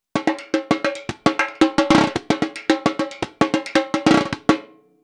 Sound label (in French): Repinique rythme de base